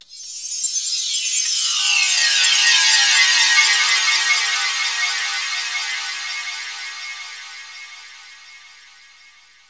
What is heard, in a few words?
GLASS BEND; Distored Reality